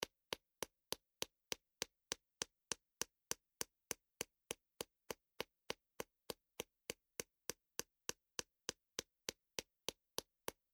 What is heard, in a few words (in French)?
Ballon